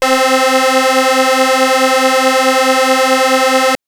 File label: Synth Sync Pad c3